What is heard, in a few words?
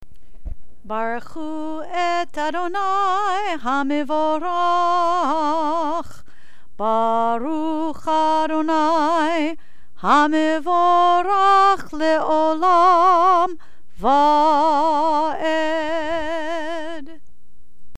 barchu, chants